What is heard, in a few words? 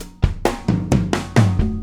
Acoustic Fill, Drums